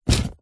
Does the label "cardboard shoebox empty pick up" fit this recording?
Yes